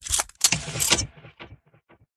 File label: Bazuka Reload